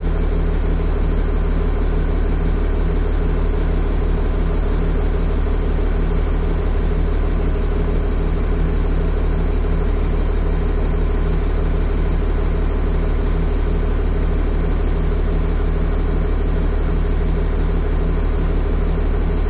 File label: bus stop